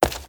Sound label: Stone Run